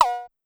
Cowbell Down Fast, Pitch Env Various, Clavia DDrum; Clavia DDrum-AT